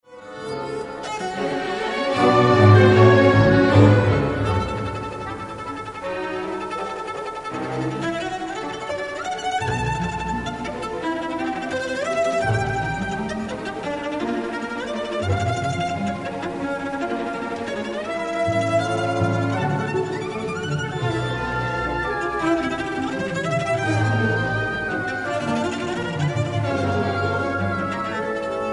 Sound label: Cello Concerto